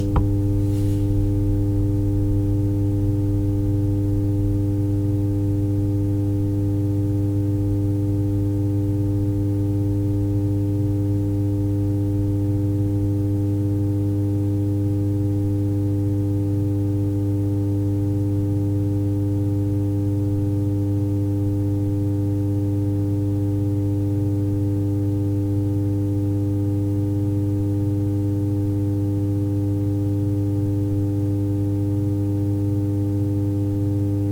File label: thumper jack from amplifier